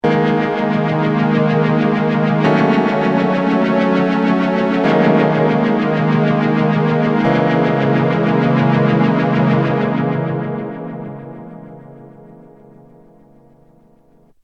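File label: Yamaha phaser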